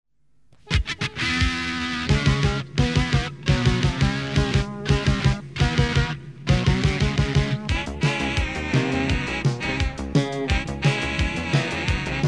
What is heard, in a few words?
Jingles; Radio Castellón